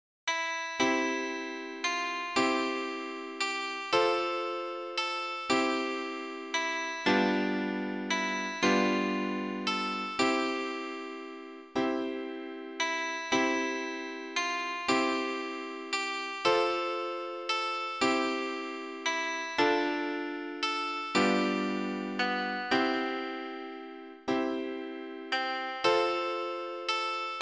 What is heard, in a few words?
koto; G chosei